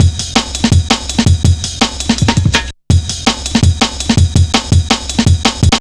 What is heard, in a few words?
165, Drum Loops; 90 s Sample CDs; Total Drum&Bass